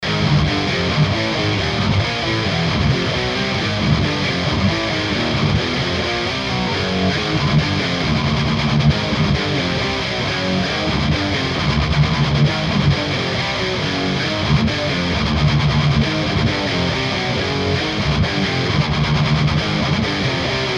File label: 4 GITY KEMPER